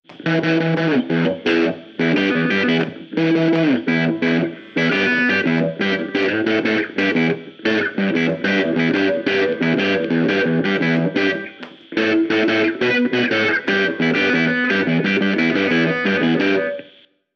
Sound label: solo, Rockabilly Guitar